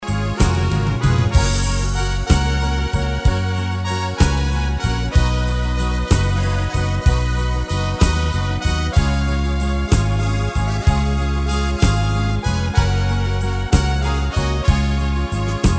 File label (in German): Voll Playback